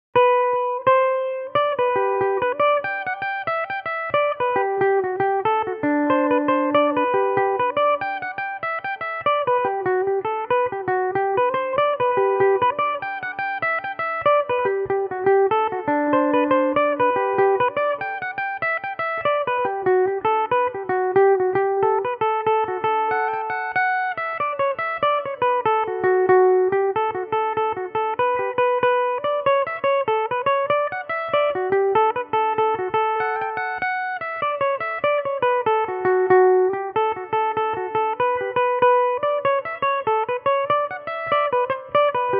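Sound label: G major D major belmuse, irish mandolin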